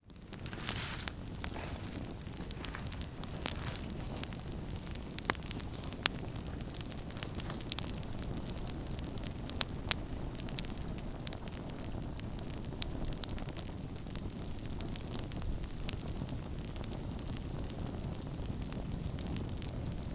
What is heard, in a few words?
Campfire Deer Camp Adding Kindling Med Wind